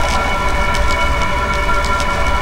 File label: Drone